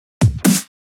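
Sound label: Drum Loop 128BPM snare Fill; VEC5; Drumloops; Multi Loops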